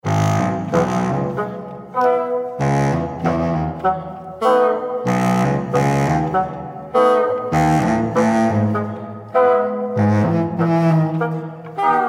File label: Minuet and Dance